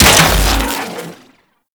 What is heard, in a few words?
shock rifle fire; Warframe; Weapons; Corpus